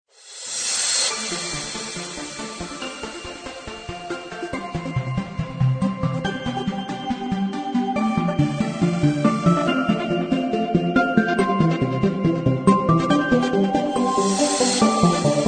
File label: Techno Trance Ringtone